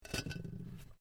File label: Brick Medium Hollow Move